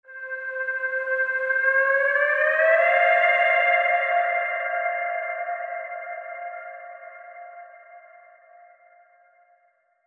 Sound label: Light Wind Bend